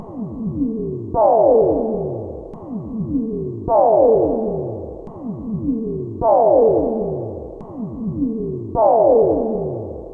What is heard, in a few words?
Simple Osc Loop; radiosonics